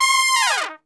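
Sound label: C 4 TRP SHF, Trumpet; Best Service; Brass Super Section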